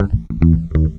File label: FUNKY, Grooves